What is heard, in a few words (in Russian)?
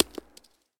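коротчкий чёткий удар по бейсбольному мячу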